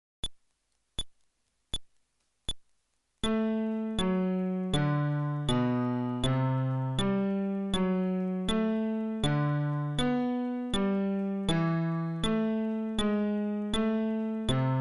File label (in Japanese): フレットレス (テンポ80)